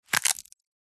dry dead bark section twist crush snap; zapsplat

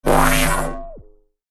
science fiction electricity spark surge; zapsplat

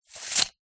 tape measure metal release retract; industrial; zapsplat

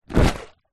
leisure lilo popped full of water place down

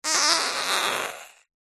human fart squeezed wet at end

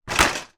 transport bicycle impact crash hit fall over

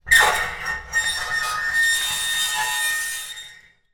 foley metal scrape shrill

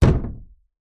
impact wood thump hit hollow

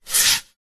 wood long branch pole slide hand; foley

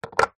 foley massage roller ball set down on wood table

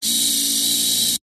science fiction android servo robot movement; zapsplat

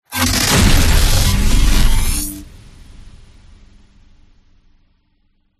IMPACT

